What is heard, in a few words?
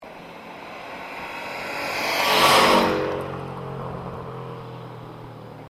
after 5k pass, cat-back; sti